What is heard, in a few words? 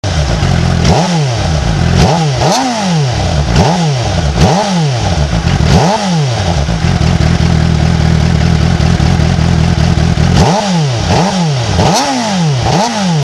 gsr 600, suzuki